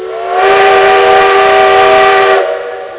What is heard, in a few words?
chime